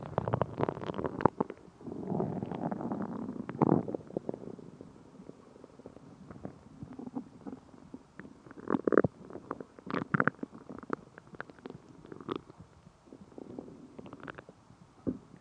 ya argh angry early morning stomach factory sounds